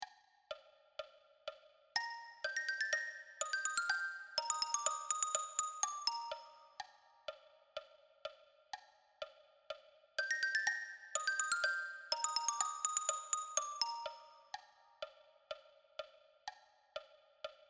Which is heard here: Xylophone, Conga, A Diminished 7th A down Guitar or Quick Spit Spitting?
Xylophone